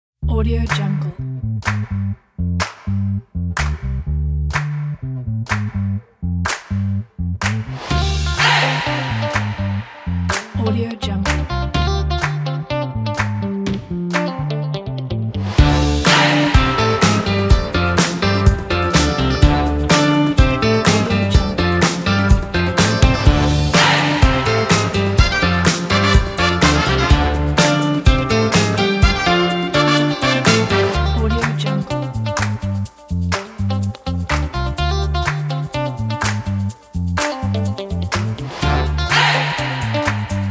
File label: funky groove